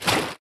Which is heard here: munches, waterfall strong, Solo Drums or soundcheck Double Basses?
waterfall strong